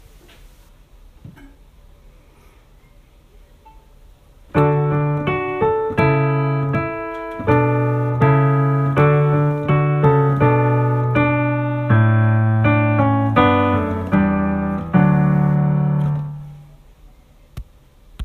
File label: piano